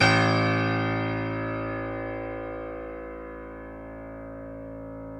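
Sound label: piano, Grand piano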